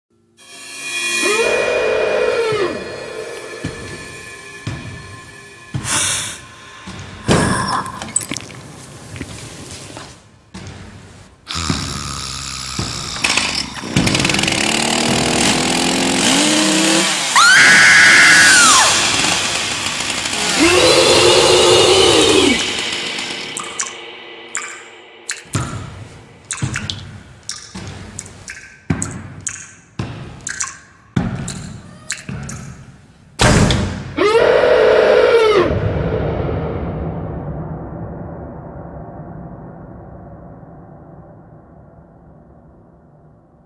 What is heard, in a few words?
Chainsaw Attack